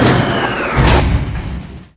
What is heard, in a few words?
freight lift stop